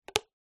foley rubber band around rolled up news paper ping